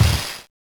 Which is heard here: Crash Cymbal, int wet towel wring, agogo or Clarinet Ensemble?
Crash Cymbal